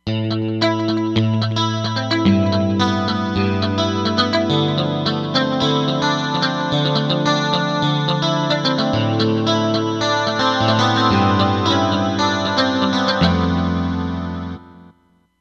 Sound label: Strat-Egic, Roland Fantom XR